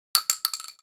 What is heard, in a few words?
12cal shell concrete, shotgun; bullets